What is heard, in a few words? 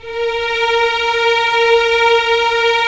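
Strings Violins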